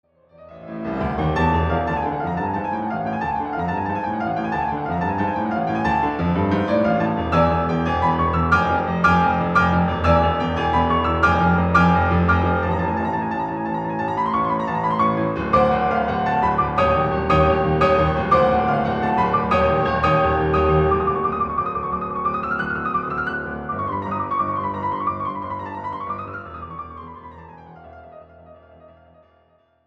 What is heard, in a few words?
piano